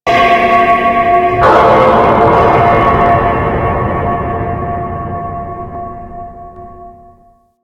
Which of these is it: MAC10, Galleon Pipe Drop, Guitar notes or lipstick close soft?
Galleon Pipe Drop